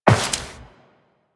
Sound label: Step Metal Perforated, Footsteps Walk; DE; Warframe; Tenno